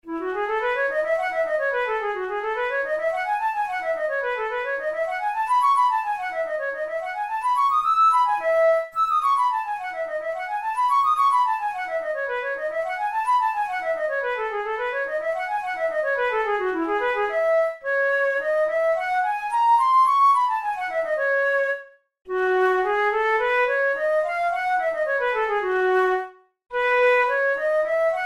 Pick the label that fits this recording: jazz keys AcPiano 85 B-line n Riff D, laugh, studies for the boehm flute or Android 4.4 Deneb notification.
studies for the boehm flute